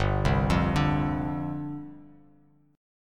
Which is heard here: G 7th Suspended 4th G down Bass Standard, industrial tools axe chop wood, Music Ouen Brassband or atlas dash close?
G 7th Suspended 4th G down Bass Standard